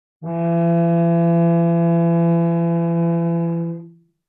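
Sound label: Cello arco fortissimo sul C E3; MIS Pitches - 2014; Strings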